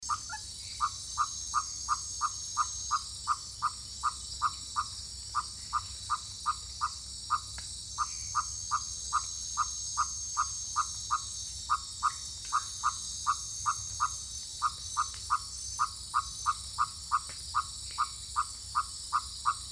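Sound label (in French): leptodactyle grogneur, Les variations rythmiques et timbrales du leptodactyle grogneur (qui ne grogne pas tant que ça !); Guyane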